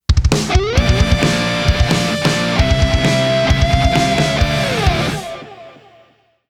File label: epic metal